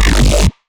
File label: Growl; Velvesque